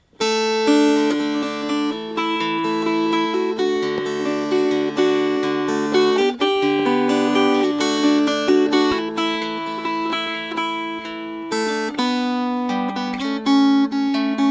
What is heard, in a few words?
Fingerpicked